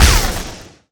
Laser

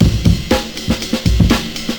Drumloop Classic Breakbeat Amen Break At 120 Bpm

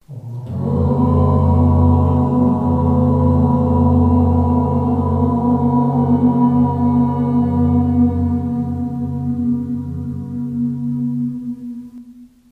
Group Chanting Om Aum

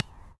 Soccer Knee

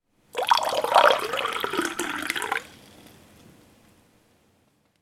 Water In Glass

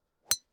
Driver Hitting Golf Ball

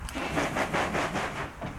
Rhinoceros Hornbill Wing

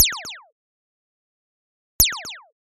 Laser Shot With Delay

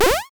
Jump

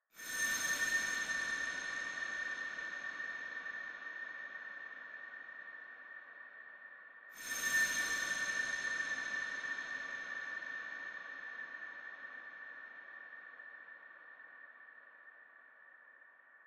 Synth Pad Reverb

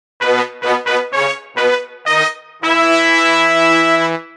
Victory Sting

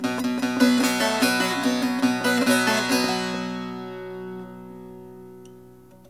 Bit Of Baglama

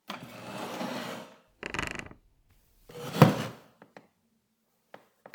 Sitting On A Wooden Chair

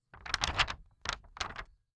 Paper Jiggle

